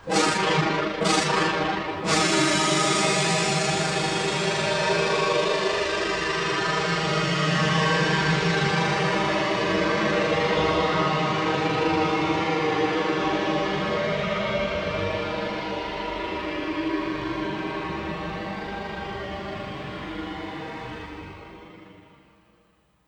Granulation BC Ferry

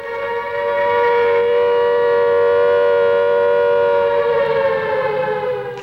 air raid siren short

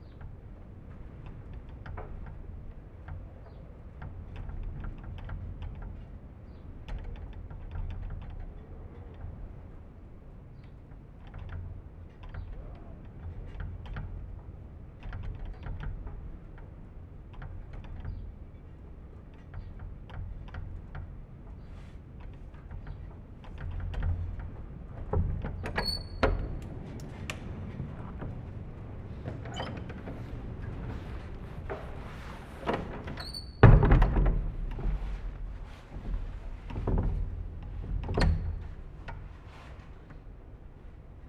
Rattle

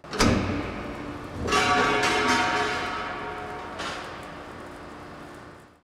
Hit, Earth & Steel

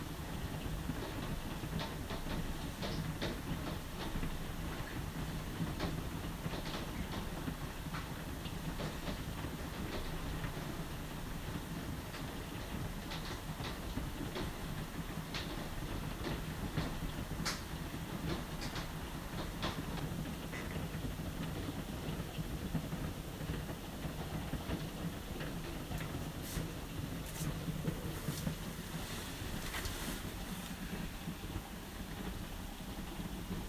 Rain; Raven

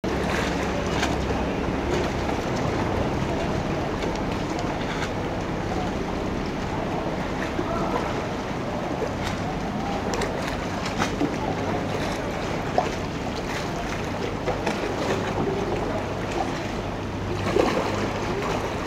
Gondola pass

